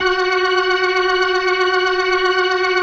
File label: B3, First Call Vintage Keyboards